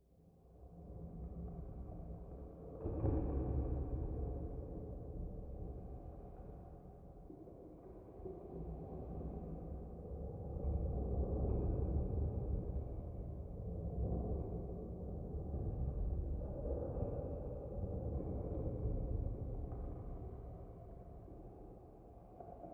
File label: atmo